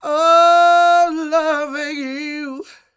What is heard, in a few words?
SING, Vocal Planet Blues Soul BLUESY